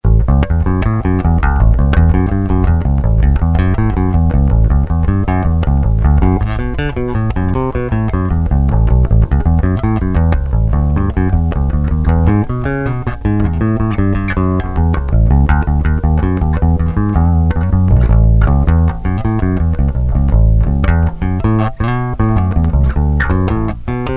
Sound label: bluesy